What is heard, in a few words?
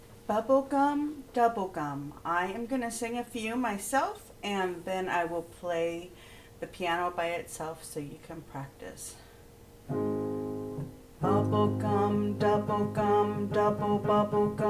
bubblegum warmup, singing excercises